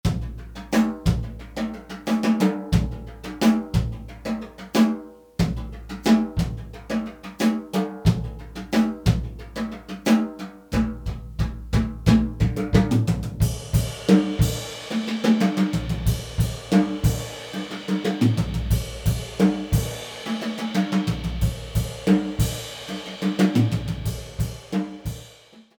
Beta 91A Room Mic

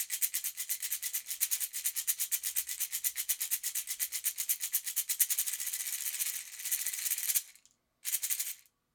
shaker

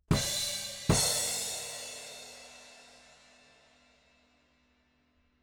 crashes

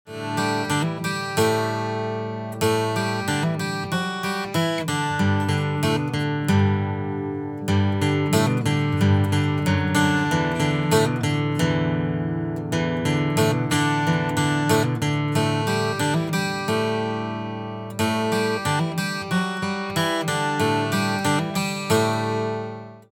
12-String

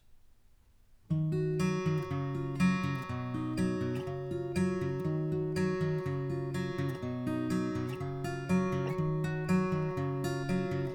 guit

